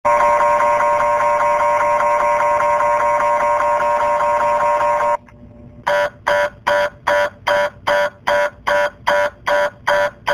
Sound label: Mri Sounds